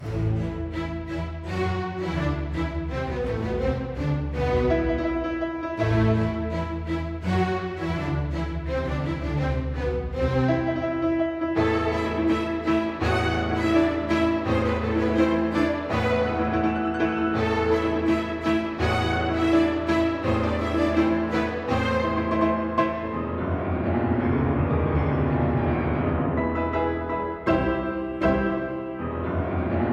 Lion Loop

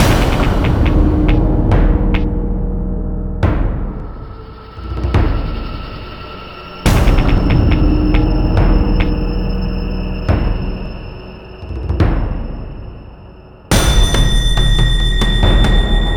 Suspense; Prototype

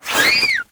ceiling dropper emerge; Hollow Knight

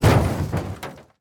Impact

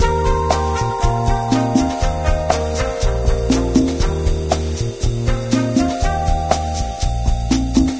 Cafe Music; Diner Dash 2 Restaurant Rescue

